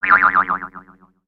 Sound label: Dragon In A Box Boing; destructibles